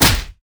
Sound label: vine stun